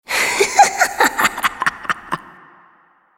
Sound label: laugh; Evelynn